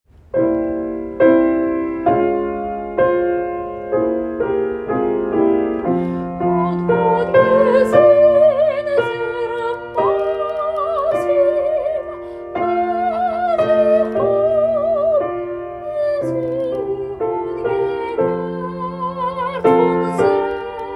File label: Soprano; Chapter 3 Pickup to m. 123 Soprano to the End; Shirei Chesed Fall 2025 Recordings